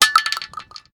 Shell 12ga metal; STALKER; IWP

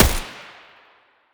sa58 muzzle mono distant

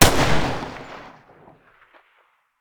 deagle muzzle mono distant, weapons

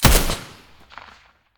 ash12 silencer mono, weapons; STALKER; IWP

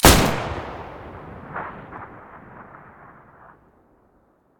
muzzle protecta stereo, weapons

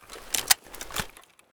groza gl activate scope, weapons

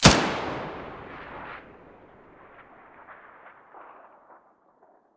muzzle mono

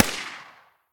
silencer p220 mono distant, weapons

